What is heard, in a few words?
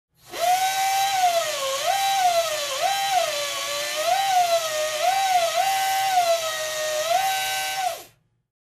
Drill at dentist